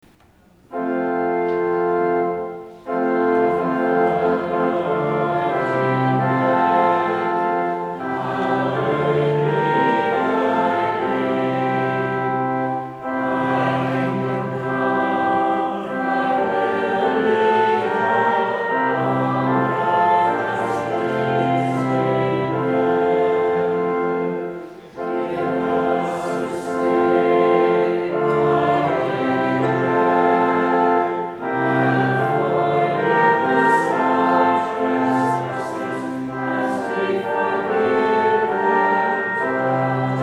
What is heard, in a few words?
Amen